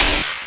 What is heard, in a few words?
red lightning zap